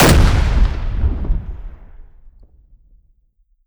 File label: claymore expl body; gmod; viper